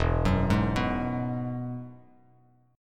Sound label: E Major Add 9th, E, Bass Standard; arpeggiated; JGuitar